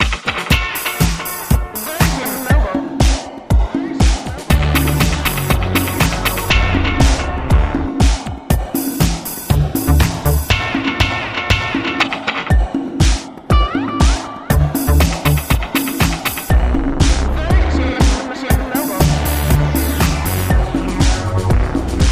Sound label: anthem; Virgin Mobile USA